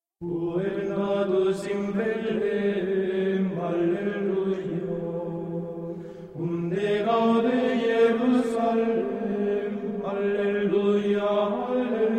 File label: gregorian race